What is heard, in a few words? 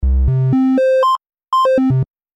noise wave triangle; retro sound effects; synthesizer; Si Robertson